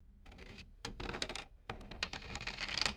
hard game pieces sliding across a board

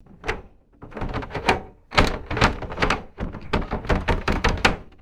grabbing and jiggling antique wooden door handle; doors

